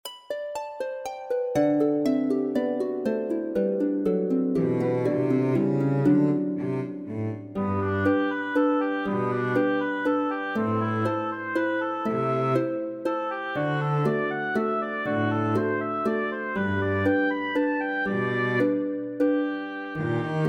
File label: Consolation in G for oboe harp cello; chamber music